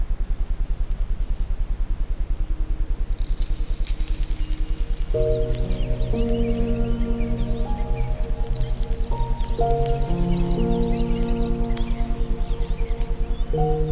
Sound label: isochronic, Ambient Sound; Frequenzmeditationen Quantum Mind Quickie